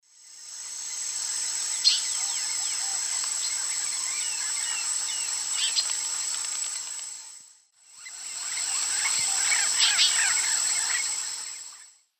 Sturnella militaris, flight calls flock; S. America; Eastern Lowlands

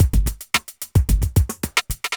evil beats 110

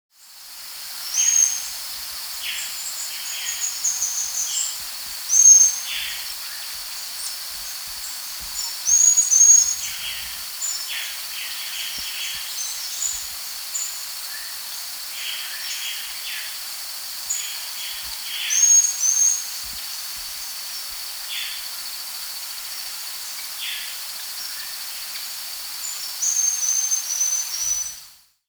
Buff-Banded Tyrannulet; Mecocerculus; S. America

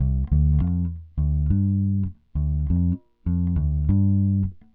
Jazz bass, Rock Bass

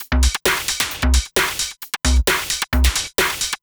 No Kick Loop, Dance Beats

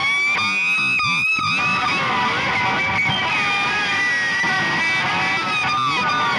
Slide Feedback Wail, Guitars; Rock

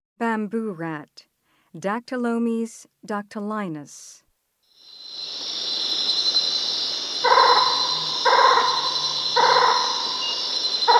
Bamboo Rat; S. America

